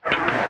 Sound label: arm retract, asteroid collector; Factorio